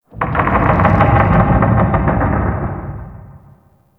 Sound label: Settlement Low Rock Creak; Warframe; Grineer Settlement